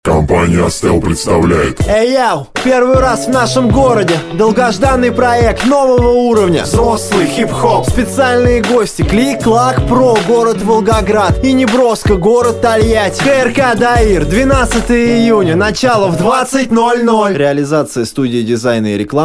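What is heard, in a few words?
Hip-Hop Radio jingle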